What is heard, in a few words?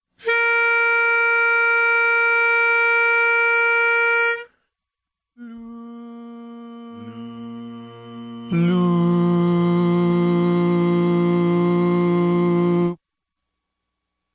Bari mono